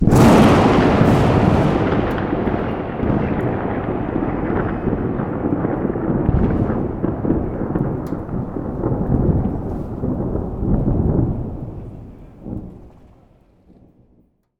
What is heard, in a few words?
thunder